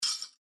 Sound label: button